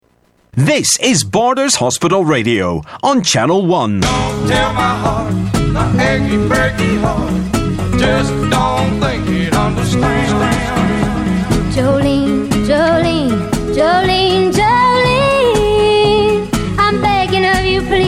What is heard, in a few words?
Jingle